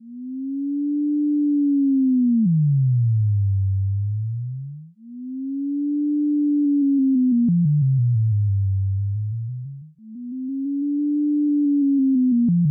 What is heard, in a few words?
Sample Hold